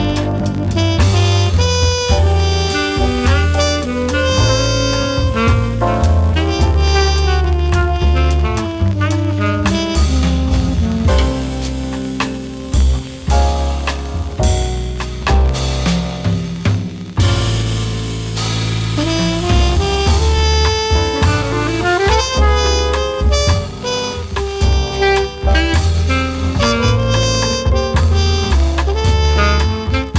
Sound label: jazz